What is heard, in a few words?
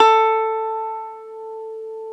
Gretsch A4